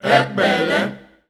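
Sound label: Rhythmic Chants, Voices Of Africa, 90 bpm; Epele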